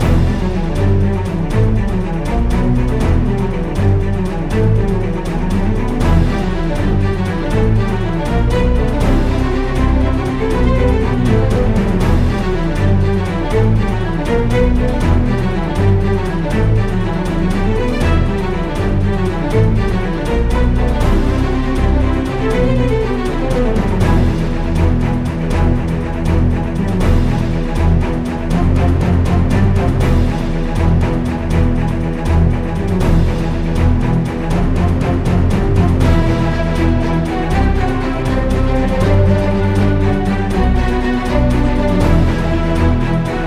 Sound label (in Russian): muzyka dlya igr